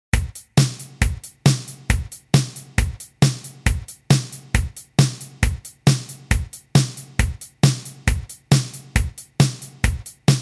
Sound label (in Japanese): drum; チープドラム; イメージ：ずっと同じ調子 カテゴリ：ユニーク・怪しい